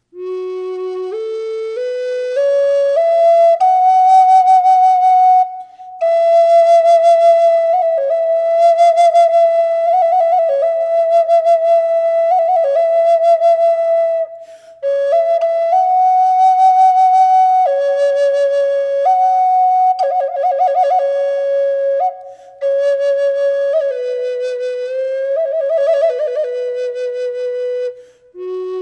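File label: reverb, flute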